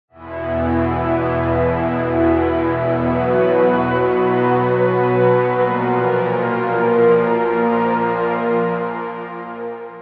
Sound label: Pad Choir; Cacophony Choir; Routed Sound; Spire Expansion; Chaos Bringer Vol.1